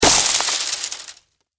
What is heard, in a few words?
Impact Tree Hit Dead Branches; Thuds (Sound Effects:Objects)